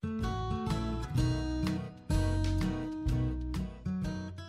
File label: Instrumental Two Step